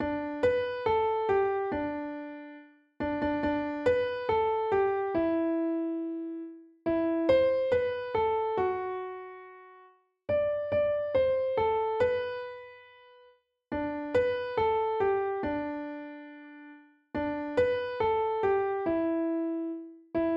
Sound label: Jingle Bells (G Major)